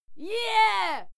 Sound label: female cheer yeah